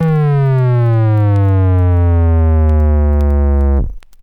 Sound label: Juno106 Subby Long Vinyl Synths C2; vinyl fx